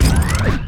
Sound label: nanobots launch; Tanks